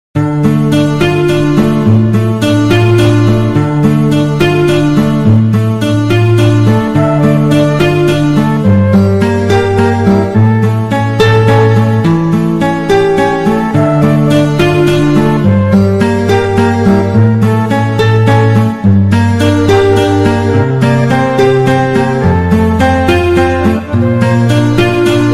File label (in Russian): muzyka bez slov iz sovetskikh filmov